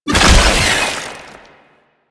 Passive Tentacle Spawn; Enemies; Abilities; Warframe; Proto Infested; Babau

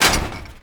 Metal Crate Hit; Gameplay; Warframe; Tau; Destructible Dynamic

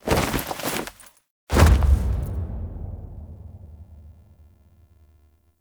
Death Fall; Warframe; The New War; Drifter; Foley

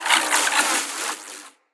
Casting Device Projectile Whizz By

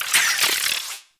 Venus Robo Fish Medium Caught; Warframe

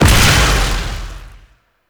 Explosion Small, Weapons; Warframe; Duviri; Incarnon Adapters

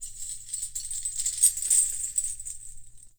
Binaural Chains; Warframe; Shadowgrapher

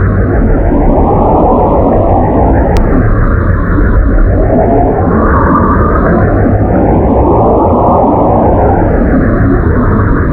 Water Tube Spin Loop; Warframe; Orokin

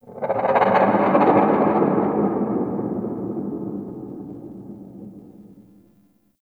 Beast Cry; Warframe; Tau

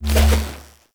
Fishing Spear Charge; Weapons; Venus Fishing Spears; Warframe

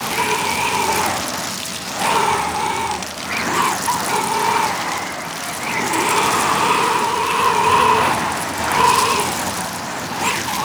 Snow Globe Loop; Warframe; Nineteeen Ninety Nine; Deep Archimedia

